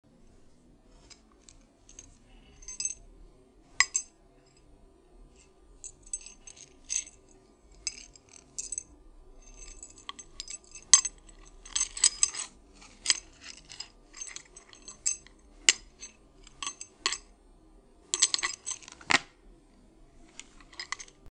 clinking chains

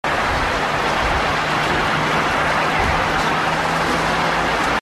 traffic white noise

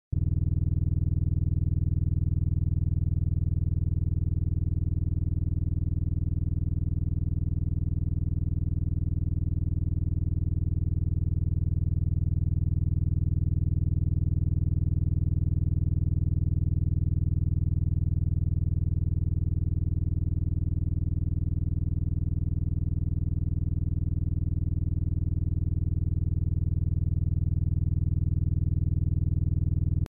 humm

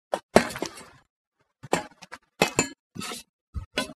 metallic clamberings

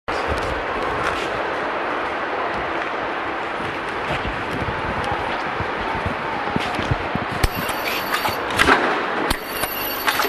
ticket gates

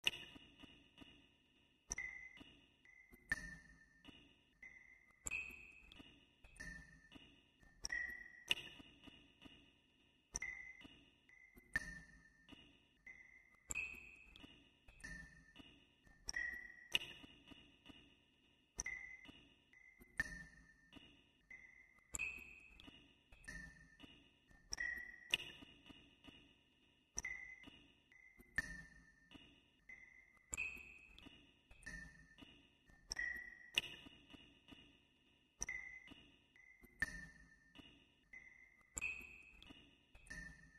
drips

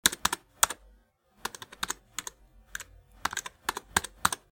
taps keyboard

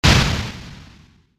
bang

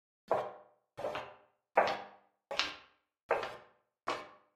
hollow footsteps

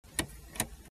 switches

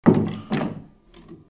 wooden trapdoor

